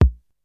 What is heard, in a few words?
kick drum one shot a sharp key, kick single shot tuned to the A# note; kick drum samples